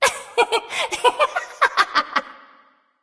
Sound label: laugh; smite; voicepack; neith